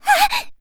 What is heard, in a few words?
die; Eternal Return; Tia; ENG